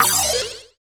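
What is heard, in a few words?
PER PROCU, Procussion, percussion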